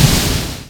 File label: attack; dota; morphling